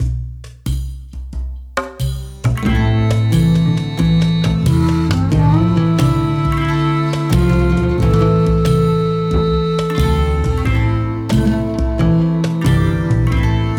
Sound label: Theme Loop; Persija